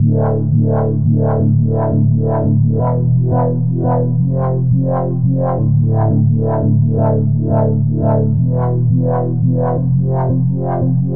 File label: Synth Mid 172 Am; Thinkers